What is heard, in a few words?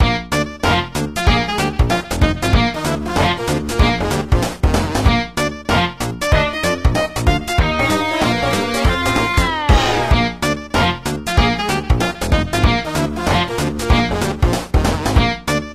guitar; DELTARUNE